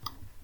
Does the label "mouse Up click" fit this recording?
Yes